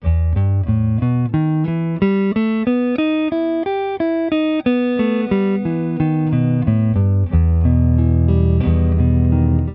Penta harmonique 1ere position, Guitare; La pentatonique harmonique & mélodique

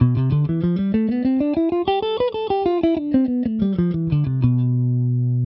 Si Locrien, Guitare; Mode Locrien à partir de Si : Tonique à la 7è/19è case; Si Do Ré Mi Fa Sol La

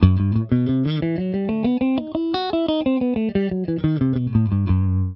Fa Diese Locrien becarre 6, Guitare; exemple audio " fa# locrien ♮6"; Mineur harmonique

